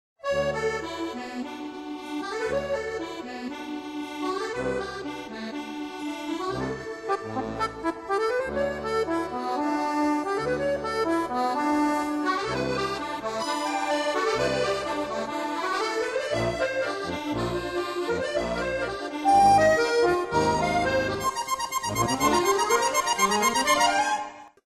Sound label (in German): Akkordeon